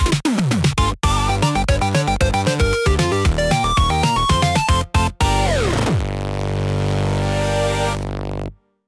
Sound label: 8bit victory call; free jingles